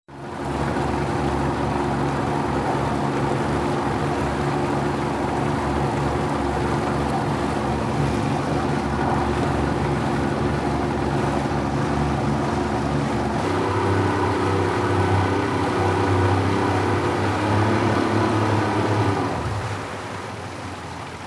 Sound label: motorboat